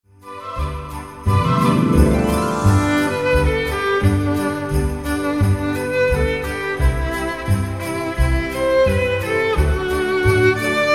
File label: Jingle Bell